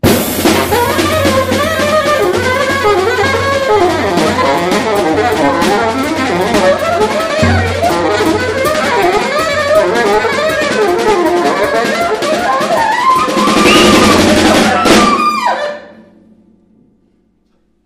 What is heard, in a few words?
jazz